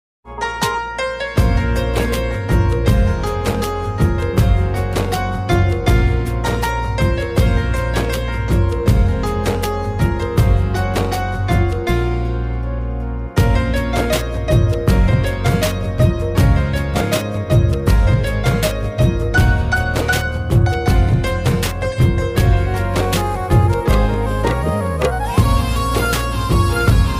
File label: instrumental ringtone, romantic ringtone